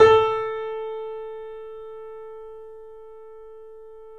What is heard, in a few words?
A, Boesendorfer, 3D Pianos; E-MU Producer Series Vol. 5; 3-D Audio Collection